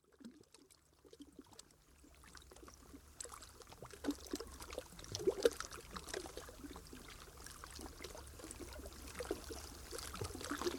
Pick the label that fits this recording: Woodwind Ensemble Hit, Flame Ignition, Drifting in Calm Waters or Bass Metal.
Drifting in Calm Waters